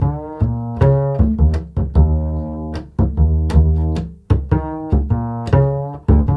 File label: CITY D#, Bass, INNERCITY; Spectrasonics; Bass Legends